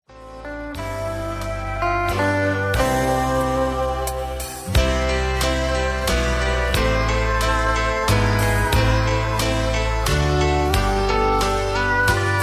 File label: music with NO LEAD in HIGHER KEY